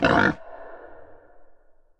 boar charge; smite; Apollo

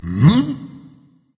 thinking berserker; cuchulainn

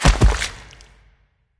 defeat struggle bodyfall; smite; poseidon

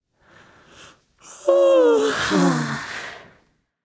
sigh; smite; persephone

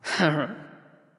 nv grumble; smite; awilix

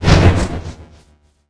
proj; smite; chaac

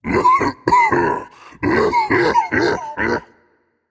clear throat, voicepack; smite; jorm

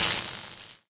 spark; smite; chronos

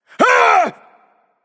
nv fear, vox; smite; voicepack; vulcan

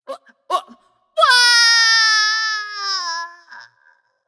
nv fear; smite; voicepack; morgan le fay

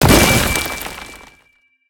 emerge; Smite; Sobek

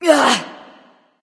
grunt hit; smite; nike

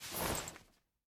armor long; smite; hera

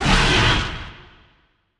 ghost impact; smite; zhongkui

